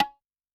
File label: Tabla H, Yamaha DTXpress-II, Perc